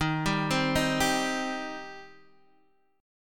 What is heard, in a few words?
D sharp 6th, Guitar, Standard; arpeggiated; D#6; x,6,5,5,4,3; JGuitar